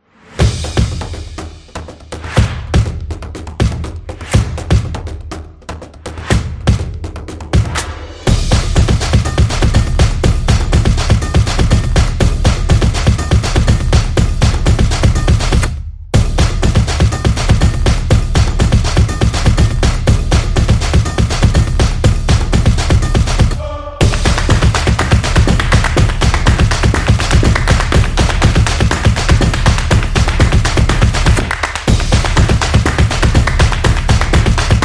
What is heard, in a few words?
The Percussion Action